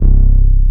BASS -R, 168 BPM Drum & Bass; Ueberschall; Drum & Bass Resonance

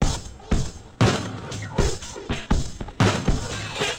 Drama Drums (120BPM); cinematic drama; Loops; musicradar

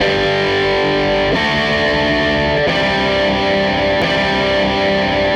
Les Guitar Loop

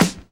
KIT, Dance Mega Drums, HIP; Best Service

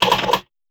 CLACK MACH; Rarefaction; Poke in The Ear With A Sharp Stick

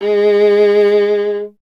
viola, solo instruments

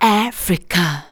vox, Techno Trance; Ueberschall

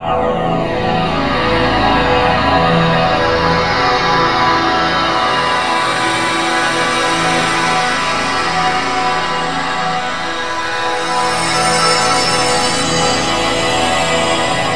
HUMAN PAD; USB Soundscan vol.33; AKAI; Disjointed Textures; TRAVEL

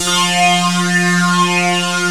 ANALOG GIANT; OMI - Universe of Sounds

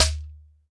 SHEKERE, African Perc

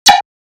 Snares, Alesis Performance Pad

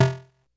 Snare, Drum Machines, Korg DS-10

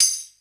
PC, Casio CTK-5000

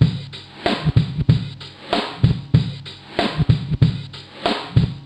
Killn L, Yamaha DTXpress, Drum Loop

